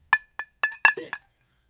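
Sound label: a group of people cheering, pub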